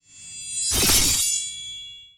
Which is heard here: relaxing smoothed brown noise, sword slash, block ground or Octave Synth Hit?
sword slash